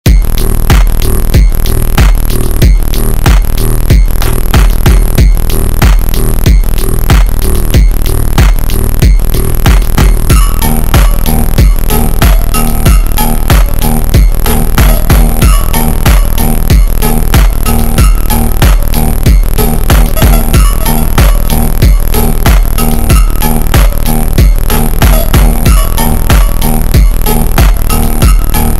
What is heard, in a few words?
Super Slowed; TikTok